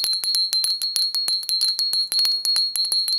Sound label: bell